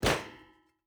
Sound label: footstep barefoot metal jump land; Rust